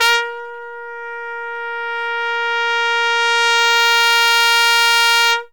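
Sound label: A# sfz, crescendo trumpet; Zero-G; Phantom Horns; CRES.TRUMPET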